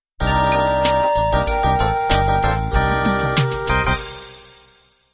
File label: 16 beat soul end